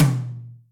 Room Lo, Acoustic Tom

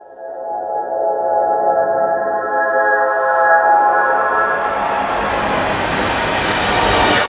Energuise Ab, Pads; Drum Bass; Zero-G; Total Drum Bass

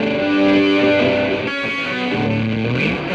Electric Guitar; Sonic Foundry (Sony Creative Software) - Friendly Fire; Serpent

